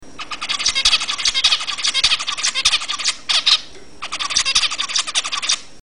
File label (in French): mandarin, Musique